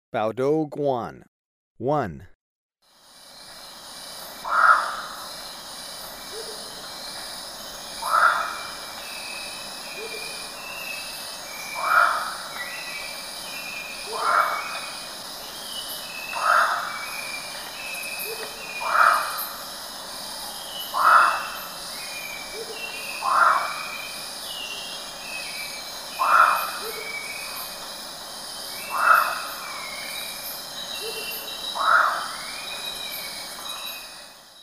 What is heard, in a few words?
Baudo Guan; S. America